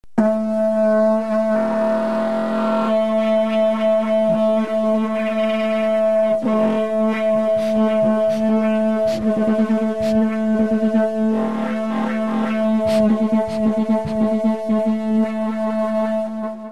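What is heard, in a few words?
trumpet drone, didgeridoo; Rhythmic Playing; Timeless Productions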